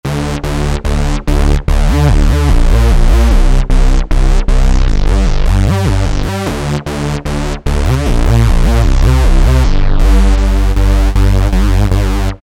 Machinery Bass, Alesis Micron; Factory Programs

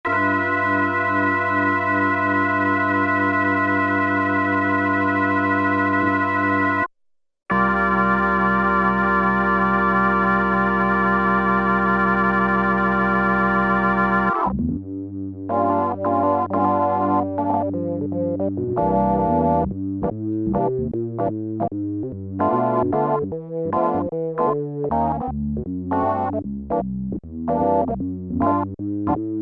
ACCELERATING ROTARY ORGAN, Oberheim OB-8; Factory Patches

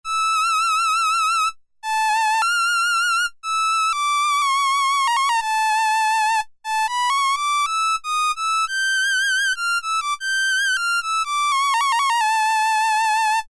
violin II, Korg M-500 micro-preset

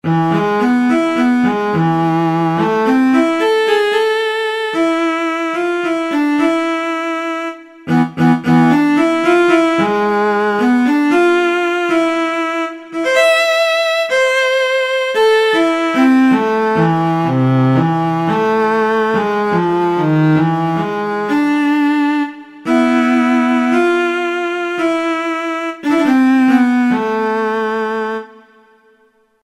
Marcato Cello; Kurzweil K2000 Orchestral ROM

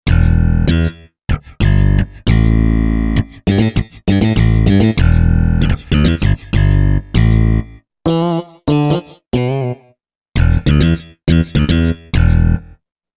Slap S Bass, Korg MSC-1S; Korg MSC-1S Variety

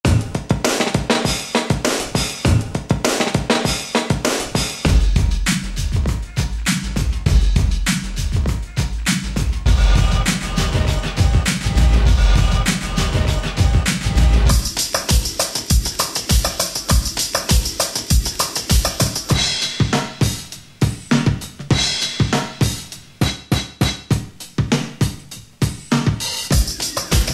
LOOP; Best Service Gigapack

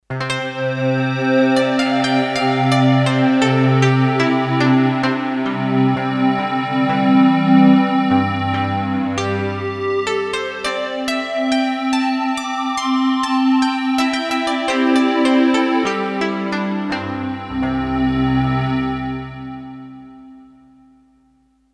Hollow Koto, Roland D-10; Factory Patches

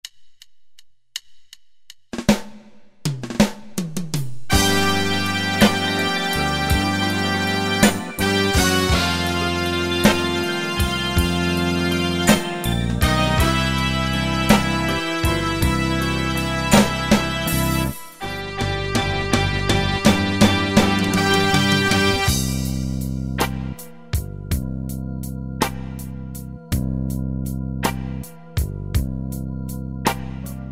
Slow Blues, Yamaha QY70; Preset Styles

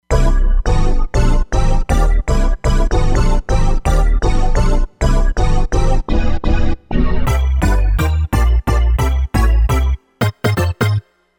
Sub Hit

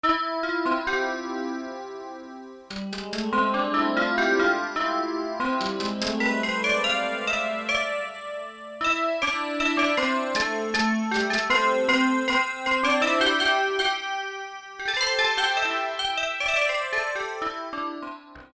Syn chime, Korg DSS-1; Japanese Inst 2

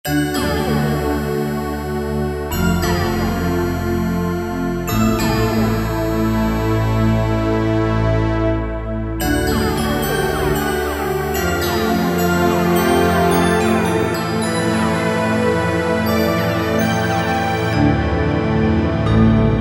Modern Keys; Kurzweil K2000 V3

